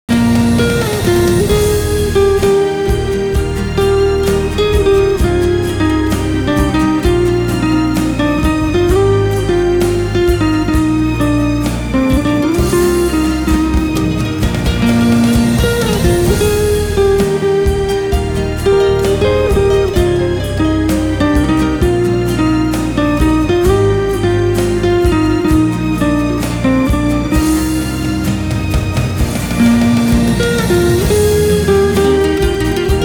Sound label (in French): guitare